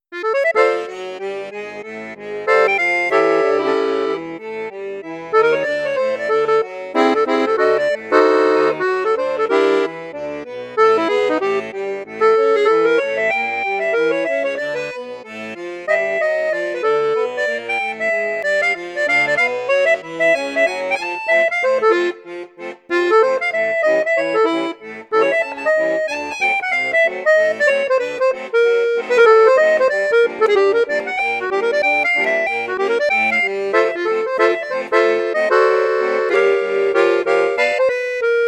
registre flute juste, accordeon; hohner vivaldi

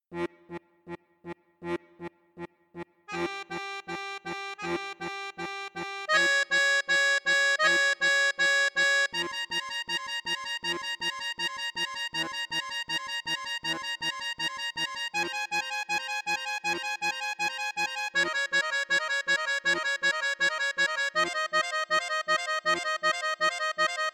registre 8 4, accordeon; cavagnolo artiste 3

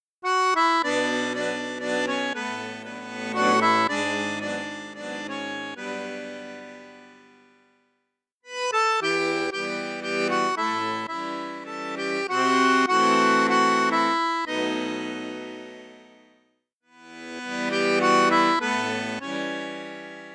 registre basson piccolo, accordeon; maugein resonance iv

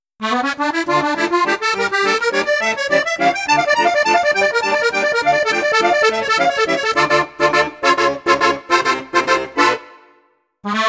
registre flute juste flute haute flute basse, accordeon; perle d'or

